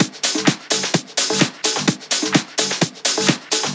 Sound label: Percussive Loops 128BPM; Vengeance Samples